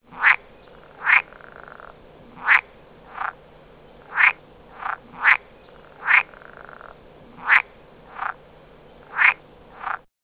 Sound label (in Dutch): poelkikker territoriumroep